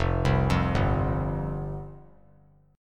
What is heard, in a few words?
F Suspended 2nd F; Fsus2 arpeggiated; JGuitar